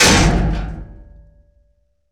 Heavy Metal Bang

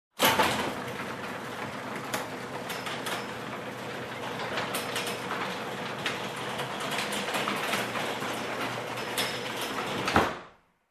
Warehouse Sliding Doors opening

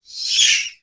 Pterodactyl dashing screech

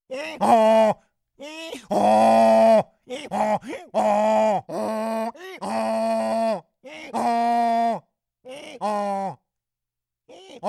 Demi-Human Grunt

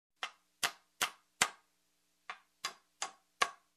Tack Hammering a Wood

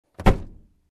Closing Car Door

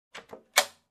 Cabin Locker Closed click sound

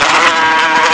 Wasps sound

